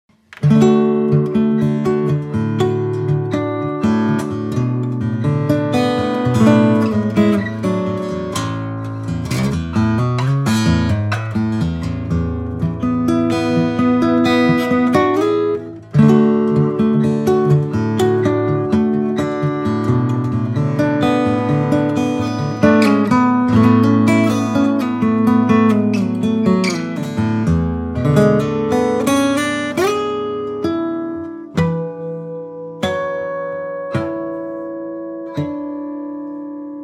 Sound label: Baritone sample, guitar